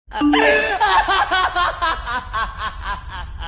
anime laugh sound effect; nhac che; nhac chuong

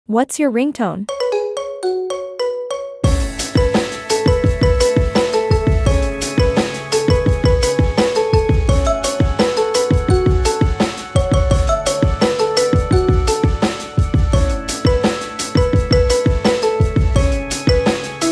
Marimba Remix

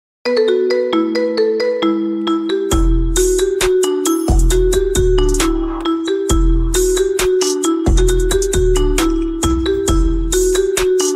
iphone 17 pro max remix; nhac chuong iphone 17 pro max remix mac dinh

